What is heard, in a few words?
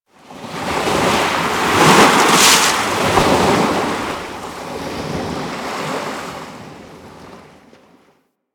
Ocean Wave Crash; Warframe; Grineer Ocean